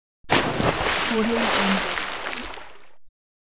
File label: splash into water; hollywoodedge